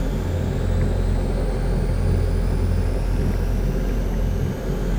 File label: colossus windup; aquatics